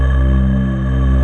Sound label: VOICE JD800; Best Service Gigapack 1